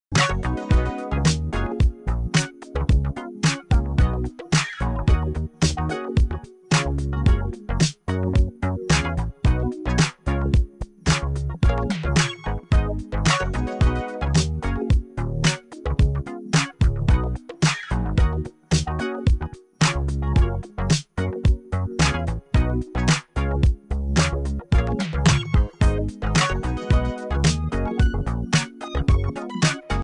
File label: feel the pop